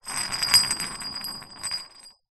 metal bar small roll tiled floor; foley

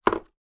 greetings card stand up on surface; foley

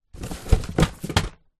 cardboard boxes pile movement; foley; zapsplat

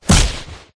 explosions short small explosion no tail

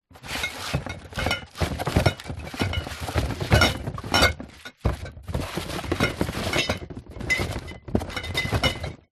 foley bottles wine in cardboard box movement

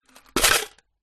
metal money tin lid remove; foley

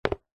foley footstep single soccer boot on tiled floor

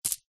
foley stones precious gems in pouch catch

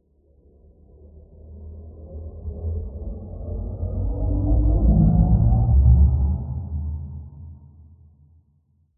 Transition Liftoff; PMSFX

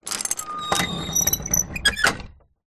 household gate driveway wooden roll close; zapsplat

